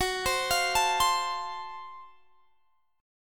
Gb Minor Major 7th Flat 5th, Guitar, Standard, chord; arpeggiated; x,21,22,22,22,20; JGuitar